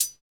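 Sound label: hi hat; HH-Kits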